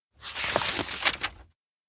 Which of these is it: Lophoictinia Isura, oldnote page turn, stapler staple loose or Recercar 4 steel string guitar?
oldnote page turn